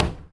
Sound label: Street Ball Glass Slap; SFX Kit